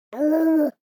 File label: BABIES TALK Boy 12 months Awoowoo; Baby Boom